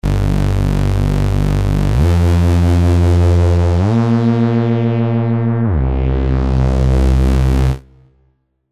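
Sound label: Reese Resonate, bass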